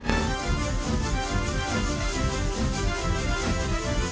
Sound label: Jazz